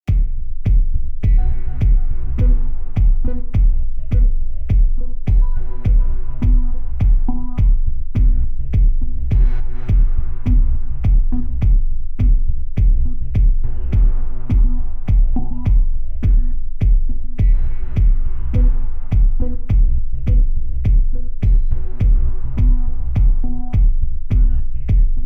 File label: B 104 Kick Groove